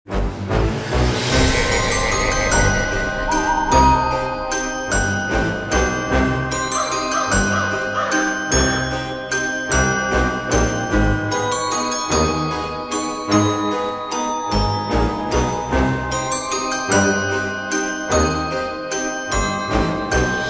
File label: Halloween Witches Ball